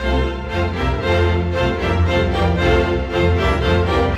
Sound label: Strings; Sonic Foundry (Sony Creative Software); Orchestral VOL-2; Modern 31